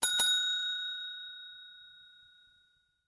notification bell

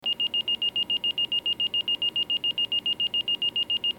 alert fire

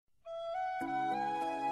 hehehehee; tiatnic

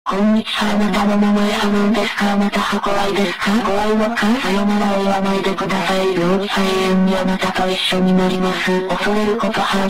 chase sound; roblox; next bot

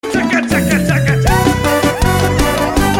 tchacatchacatchaaa

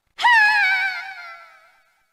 falling scream; castlevania 64; carrie fernandez

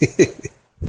hihi; cadunico

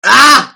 pain

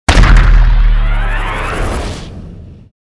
critical hit slowed

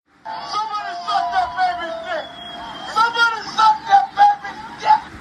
baby; cuba gooding jr

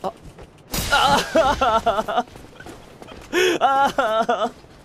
frustrated crying; dark souls

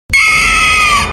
bird voice; opila

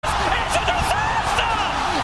echo slam; universe; slam dank; dota 2; ti5; the 6 million dollar dunk

